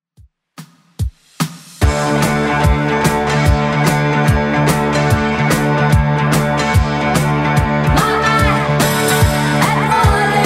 70s Redrum 146 bpm

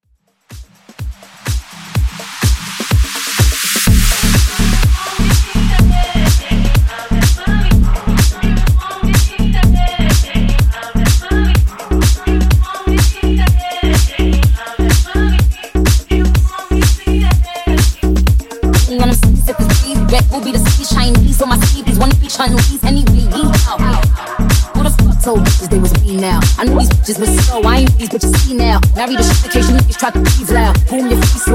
Sleeze Funk Bootleg 125Bpm

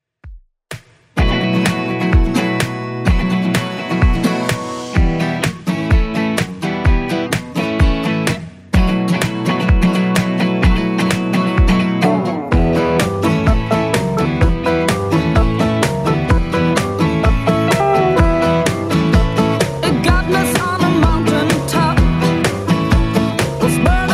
60s Redrum 127bpm

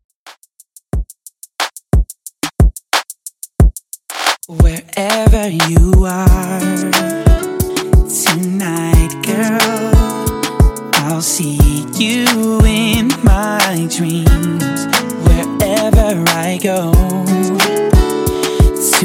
Pop Redrum 90Bpm

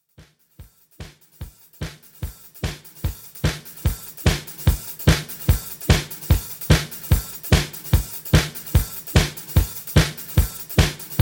147 Bpm; Dj Intro Outro

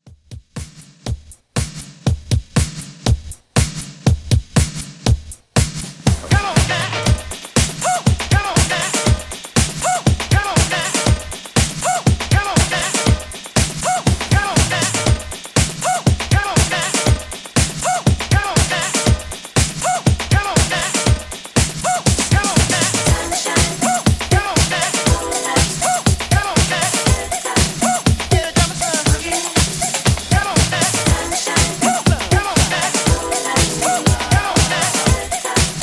Classic Dance House 120Bpm

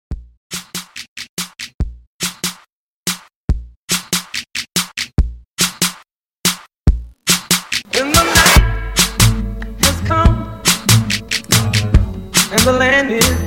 Intro Re Drum

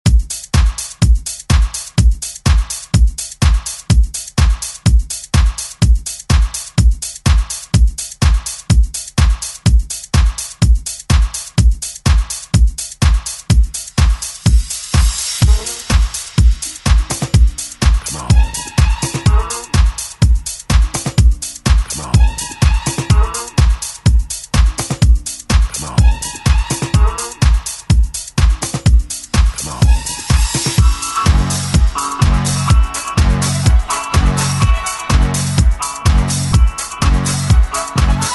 70s Re Drum; DJ Mhark

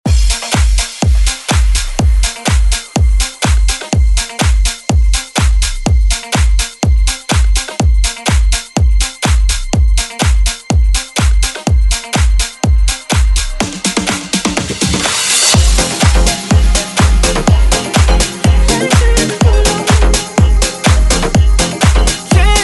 Future House Edit

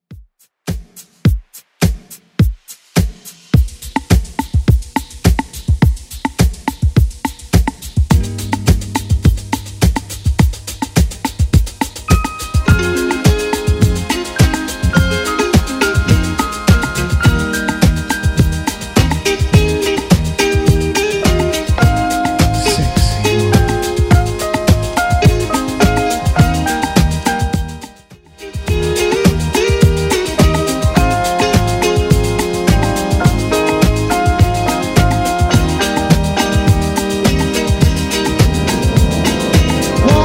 70s redrum 105 bpm

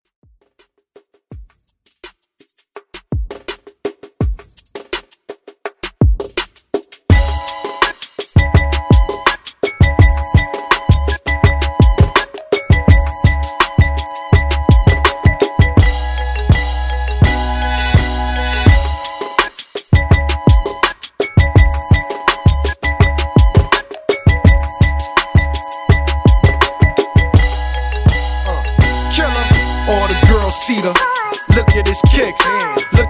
Throwback Redrum

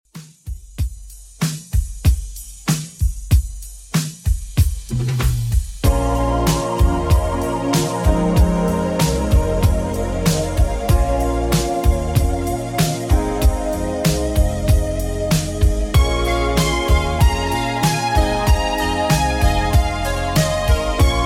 80s Redrum 95bpm; remixplanet

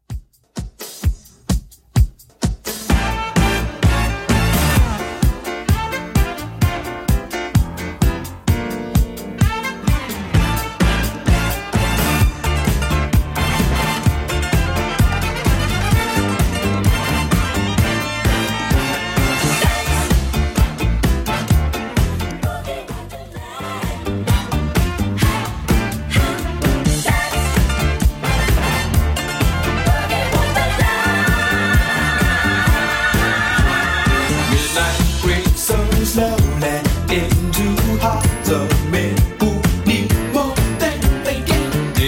70s Redrum 129 bpm

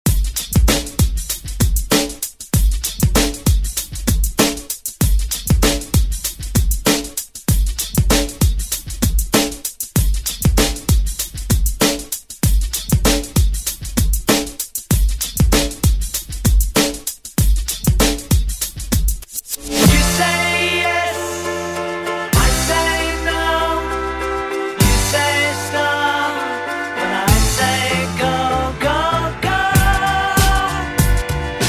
Rock; Deejay Hit; Redrum

